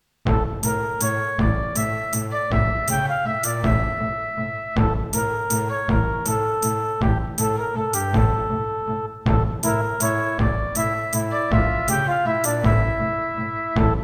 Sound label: Roland CM-500